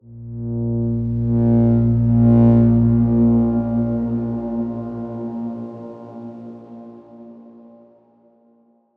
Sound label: Darkswarm A#4 mf; HSS Ableton Pack1